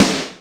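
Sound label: BIG FAT SNARE; Greytsounds; Scott Peers Sound Engineering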